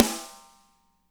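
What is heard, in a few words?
snare right; Spectrasonics; Burning Grooves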